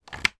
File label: headphone plug in laptop socket